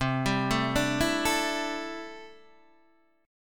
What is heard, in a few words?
C 6th Add 9th C down Guitar Open D